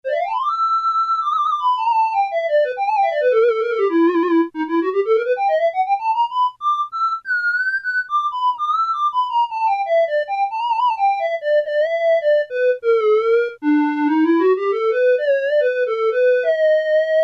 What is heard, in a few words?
Clarinet; Casio MT-600